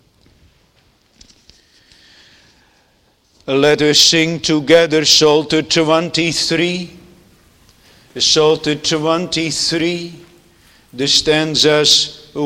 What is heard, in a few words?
Singing